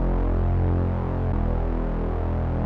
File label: Saw Bass; Slam Pro